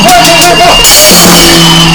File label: Feedback Undertones; lolicore